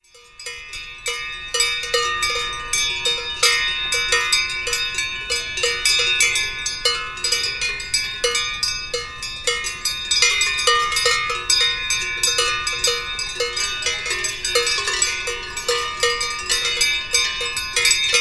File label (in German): Glockengeläut